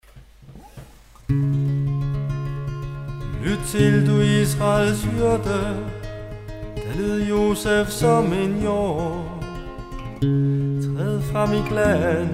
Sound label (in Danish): tone gregoriansk